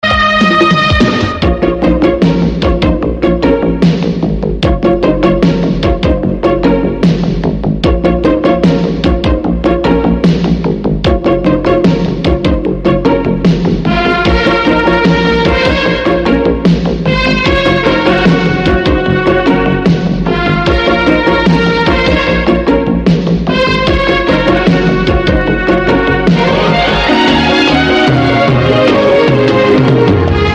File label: telugu movie bgm